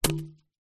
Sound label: tennis racket drop grass